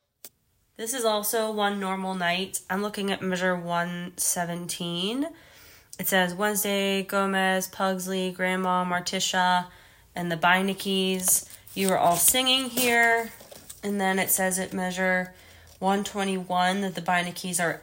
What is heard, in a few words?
leads